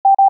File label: short double high; iOS System Sounds